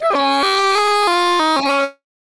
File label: crash